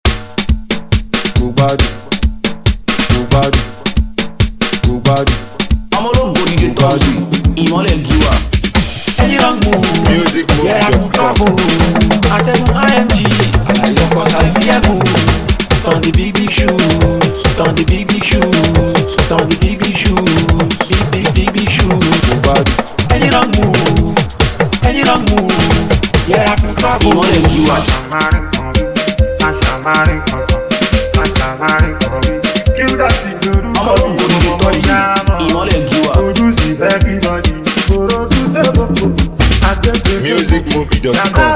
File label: Drum Beat